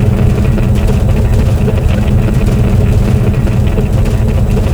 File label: cobblestone; AOS RF1 Kart Demo